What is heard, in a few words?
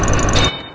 rail open; Undercroft